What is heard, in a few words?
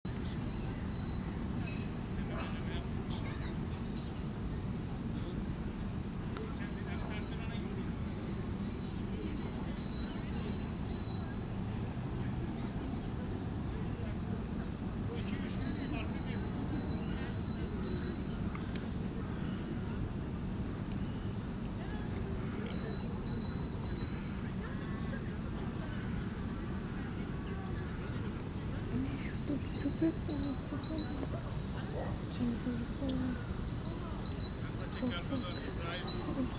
kalamis songbirds